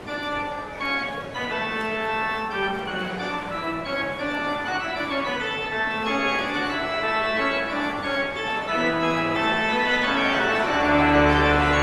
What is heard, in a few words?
Postlude